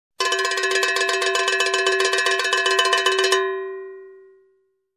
Rattling metal can

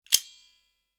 Multi plier open

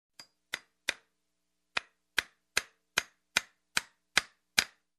Deep Hammering a Nail

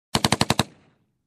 Machine gun close shooting

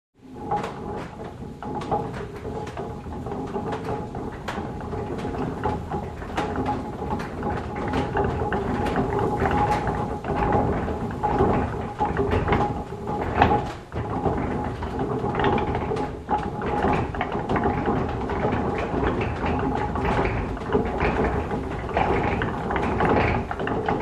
Wood being transported on a conveyor

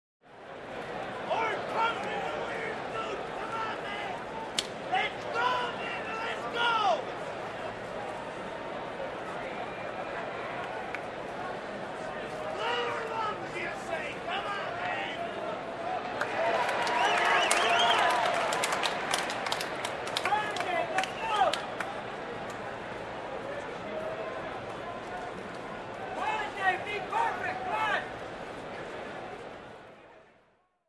Crowd waiting for a match to start

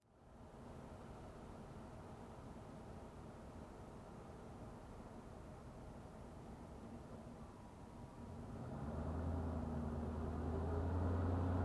Car approaching and parking

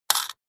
Coin Bank

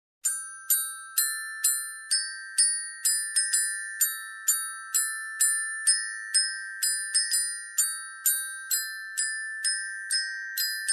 Harmonic glockenspiel melody extended

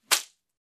Short single shake

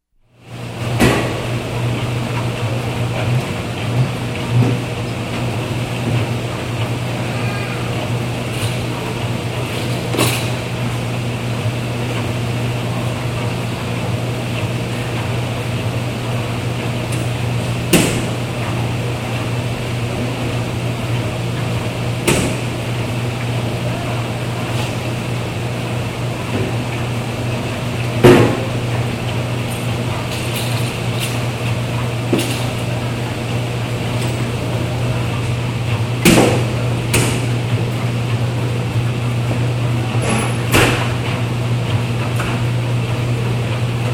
Noisy machinery in workshop

